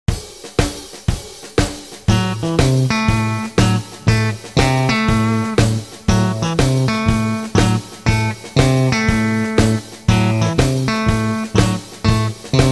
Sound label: Rhythm Guitar